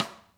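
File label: side stick, Interactive Drum Kits; Sonic Reality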